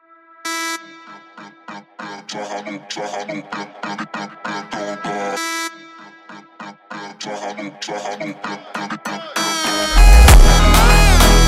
Funk super Slowed

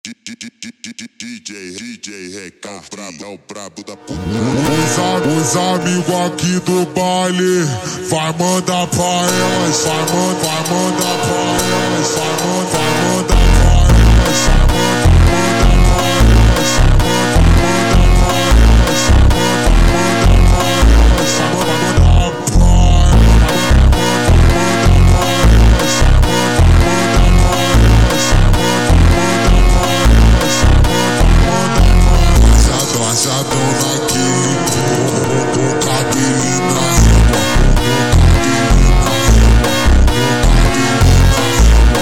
super Slowed, funk

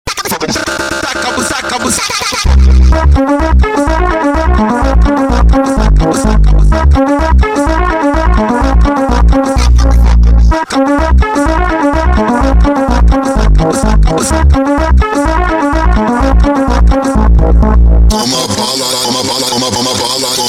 Funk slowed

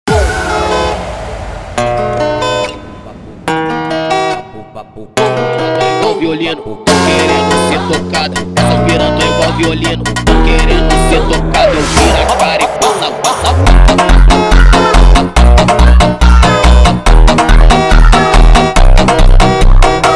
Scared Girl Funk speed

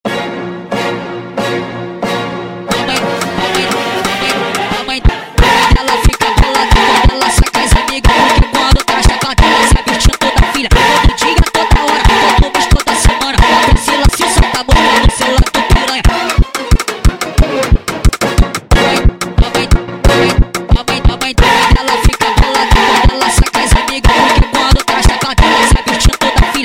Funk speed Up

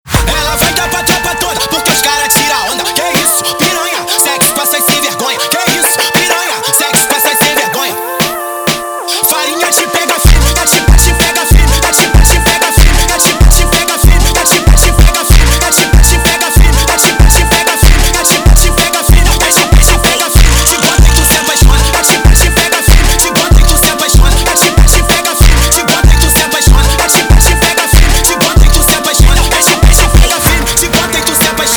Brega

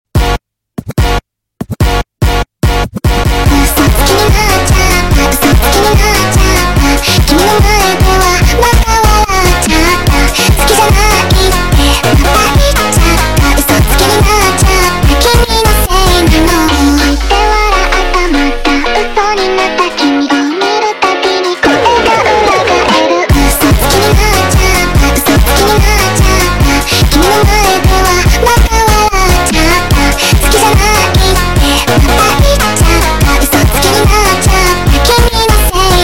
sped Up, funk

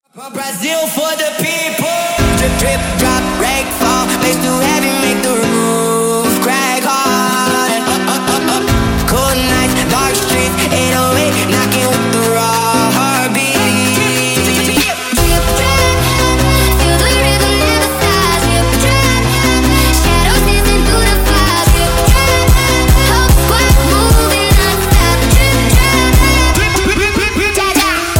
Funk sped Up

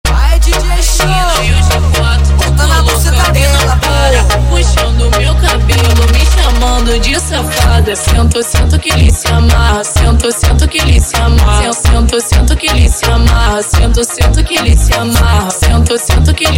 sped Up, funk

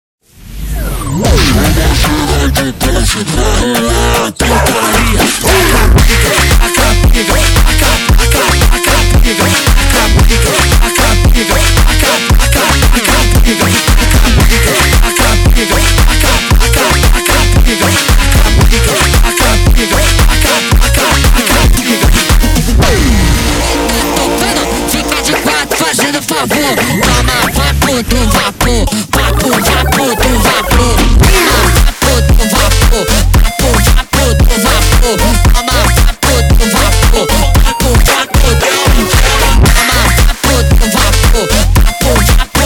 Funk slowed

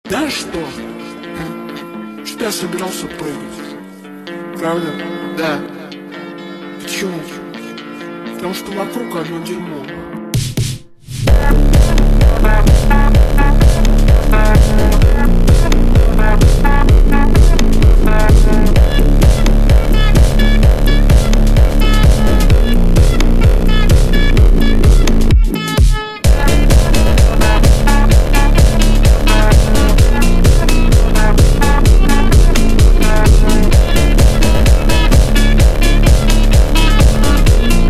hardstyle Slowed

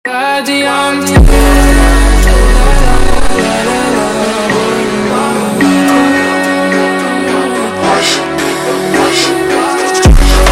Funk Unico slowed

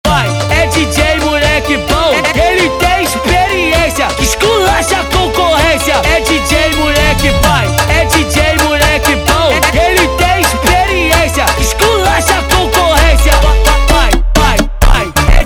Funk

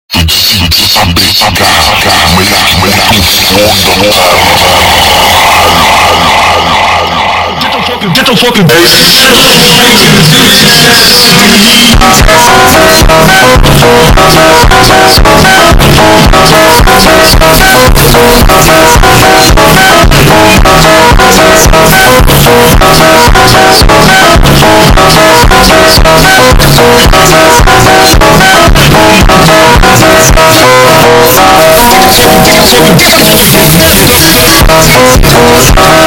Funk super Slowed

